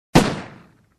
Weapon fire sound

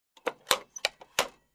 Putting paper money in cash register

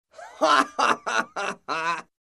Man Hilariously Laughing

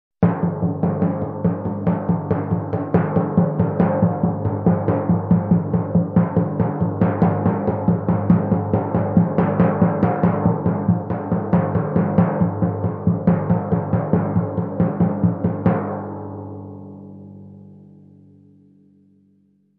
Straight 1/8 Timpani Beat

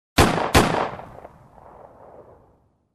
Pistol shot twice

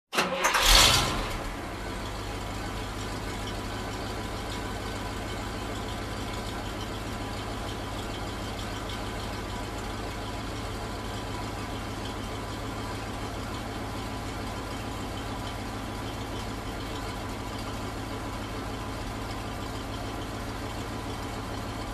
Firetruck engine start and idle

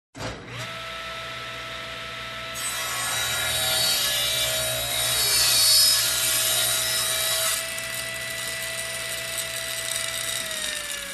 Hydraulic Cutting Machine

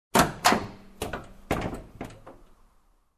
Opening Door and moving in

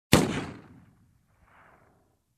Heavy handfire gun shot

